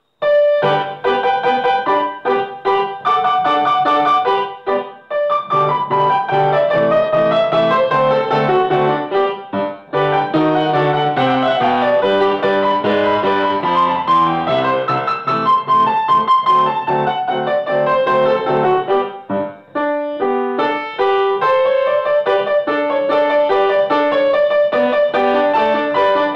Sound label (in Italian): Waltzer per pianoforte; Verbena